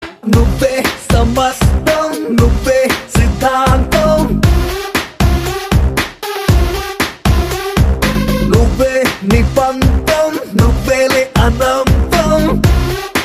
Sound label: Song Ringtone; Maharshi 2019